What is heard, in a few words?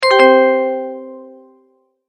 multimedia tone, Goodbye